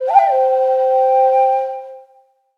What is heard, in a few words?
Flutter